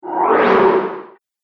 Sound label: Slow Low Air Whoosh; Whoosh Sound Effects; Andrew Fleming